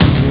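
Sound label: great bass drum; Amiga Soundtracker sample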